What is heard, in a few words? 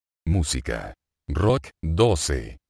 rock